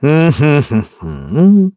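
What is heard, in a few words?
Vox Hum, Korean; heroes; KaselB; kings raid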